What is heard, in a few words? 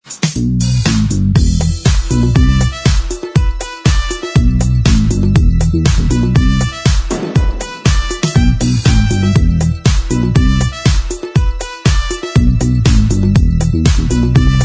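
Inst Track, instrumental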